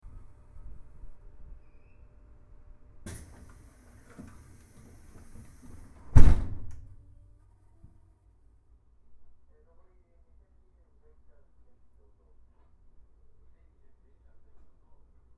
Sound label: sleeping car; jr; akebono